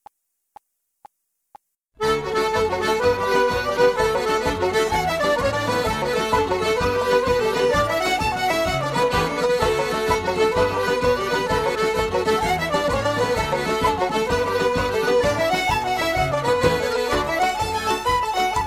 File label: Traditional Irish Jigs Medley D; backing track